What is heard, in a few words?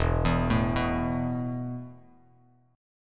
E Suspended 4th E, down, Bass Standard; Esus4 arpeggiated